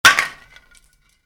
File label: Spray can drop, Spray Can: Drop Onto Concrete; Spraying; Objects; Stuart Duffield